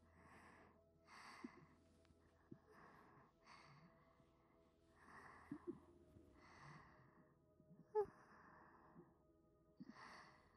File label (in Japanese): 吐息 寝息 ＋水の音; おすすめお休みトラック